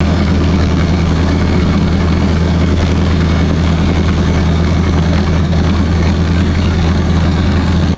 pontoon boat engine revving and idling; boats

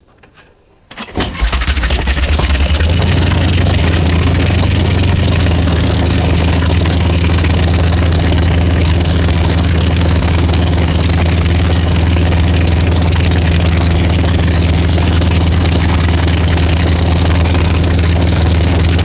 small airplane engine ignition and idle; aircraft

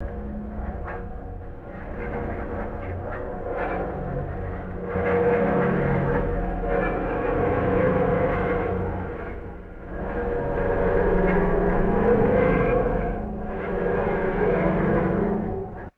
resonant metallic vibration humming

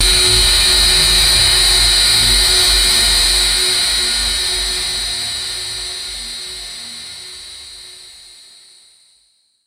high pitched synthetic laser beam; lasers

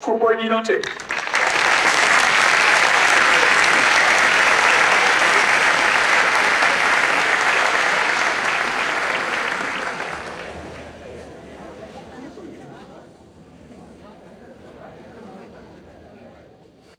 large audience applause and crowd murmur; crowds

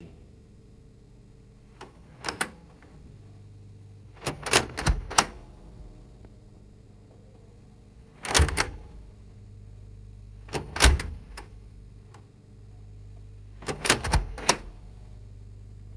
small wooden phonograph doors opening and closing

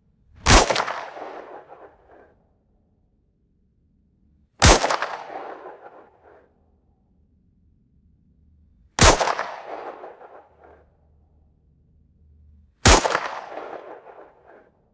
six revolver gunshots fired outdoors

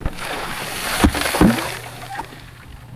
rubber dinghy dropped into water splashing; boats

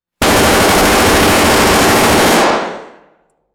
distant automatic battle rifle gunfire burst; guns

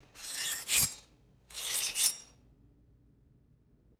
razor blade slicing thick paper

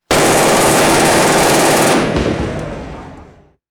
assault rifle firing rapid bursts; guns

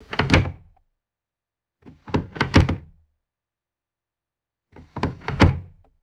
cupboard door latch opening multiple times; doors

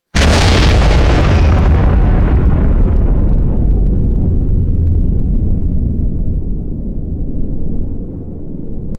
massive explosive burst with cavernous reverb; destruction

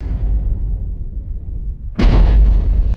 deep heavy reverberant boom thump

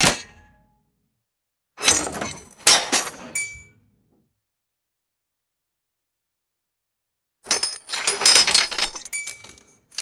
short bursts of metallic clanking

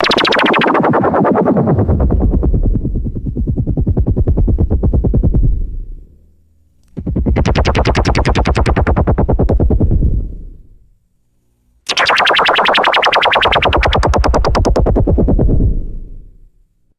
retro anime synth wobble bursts; cartoon

